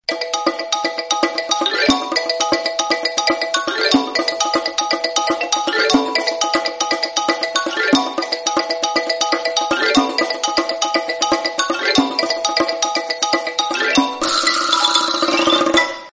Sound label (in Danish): Samsung Galaxy S22 Blæser, ringetone